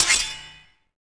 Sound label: sword